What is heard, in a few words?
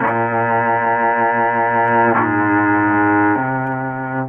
strings, Loops, Sad